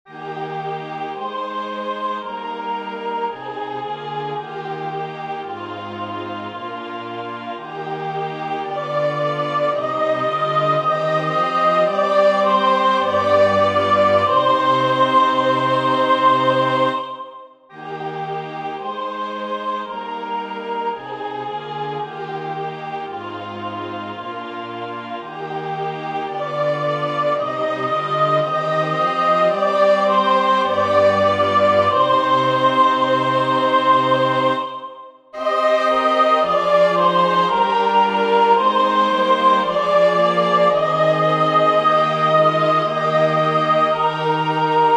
soprane; Erkenne mich mein Huter

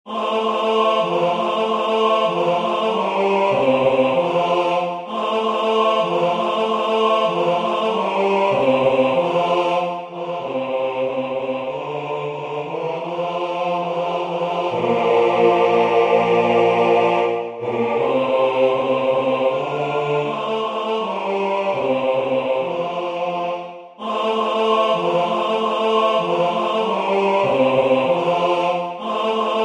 basse; Venez mes enfants

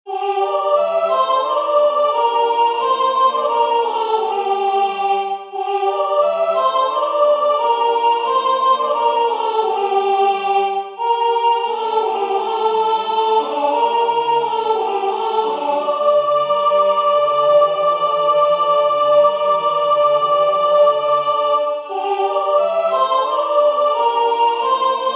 Noel nouvelet soprane; Alain

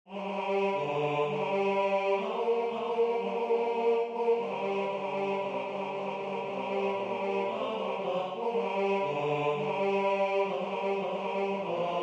La marche des rois tenor II; Lully

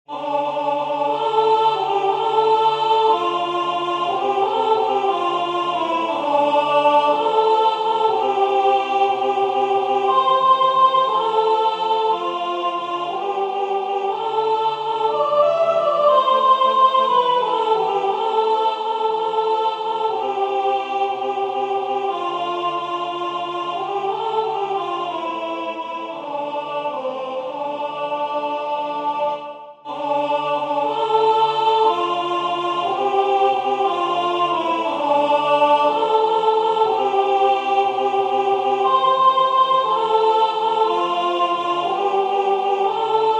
Je vous salue Joseph soprane; Du Jonchay

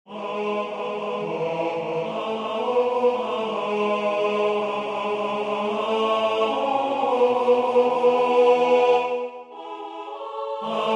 Nous venons nous consacrer tenor

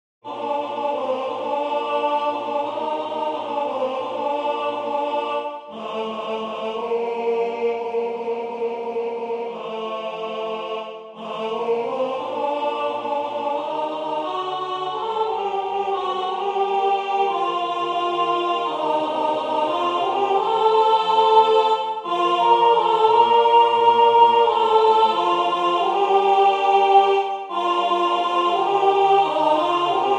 Nous t'honorons glorieux saint Joseph alto

